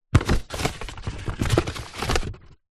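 foley cardboard box fast food empty flaps movements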